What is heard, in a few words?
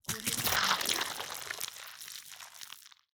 fleshy transformation